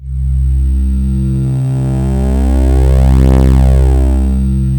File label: PAD Prophet 5; Best Service Gigapack 1